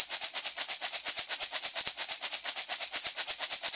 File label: Shaker Loop, 128 BPM; Vengeance Samples